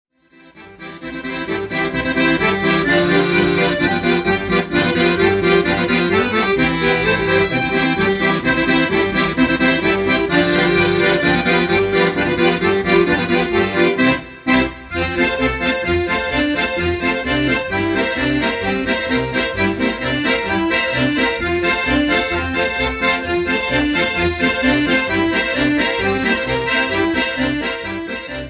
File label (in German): Trompeten Echo